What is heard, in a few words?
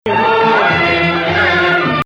Nahawand